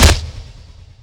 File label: attack; axe